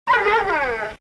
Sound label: dog love; animals